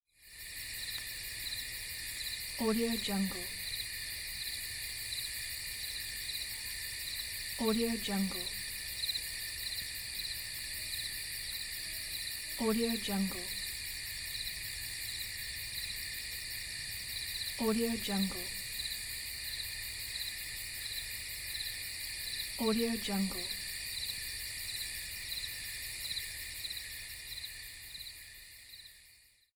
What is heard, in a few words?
crickets